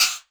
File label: Elektron Monomachine, Drum Machines